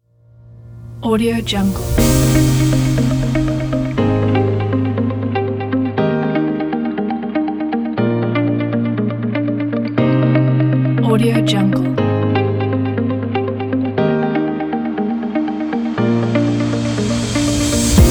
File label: upbeat corporate pop background